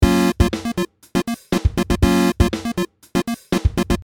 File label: synth no choirs